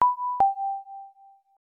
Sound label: Phone Tone; Akai Suishou no Hitomi; PC-98